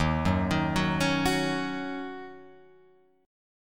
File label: D sharp Minor 7th, Guitar, Open F; arpeggiated; JGuitar